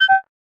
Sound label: Self Camera Out; SKY; IM-A890S VEGA Secret Note